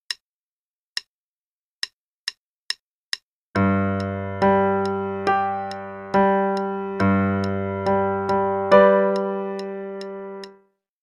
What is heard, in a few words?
Octave Warm-up (qn=140); Adult Level 1 - page 90